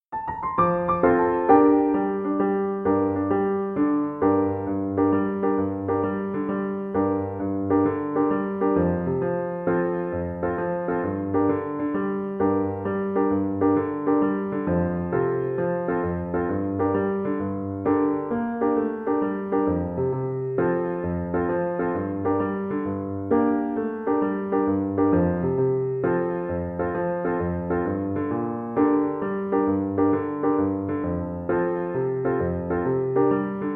rocky road viola

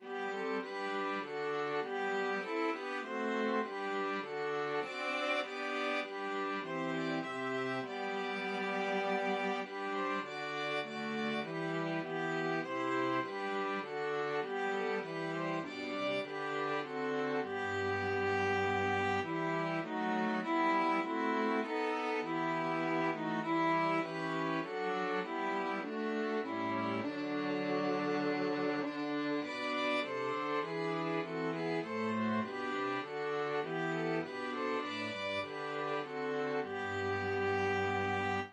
STRING quartet

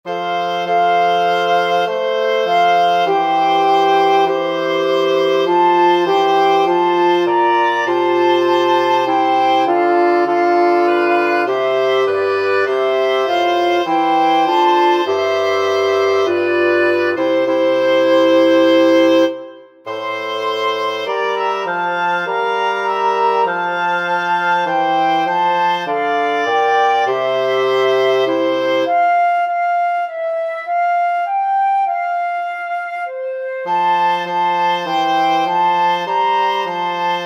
wind quartet

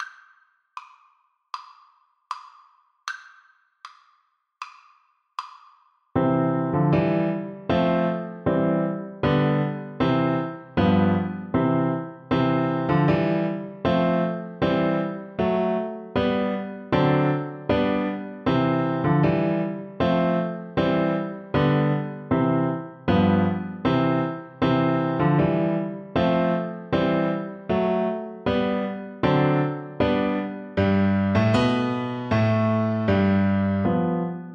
schumann soldier, Viola

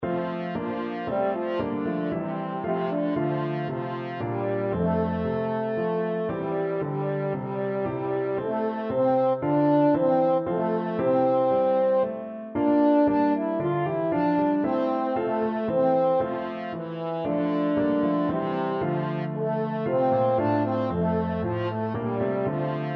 be thou my vision, french horn

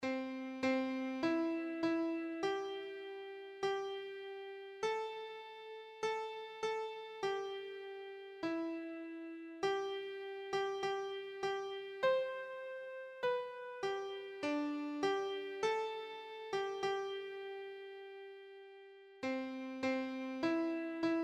holy holy holy, keyboard

